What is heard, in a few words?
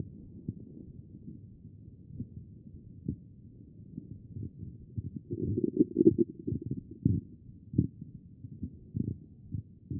A CHEST; B MODE